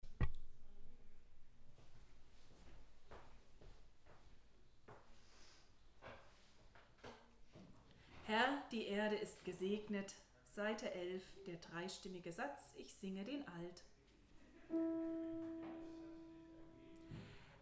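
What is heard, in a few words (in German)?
Herr die Erde dreistimmig Alt; Chorfest der EKM auf der BUGA am 26.06.2021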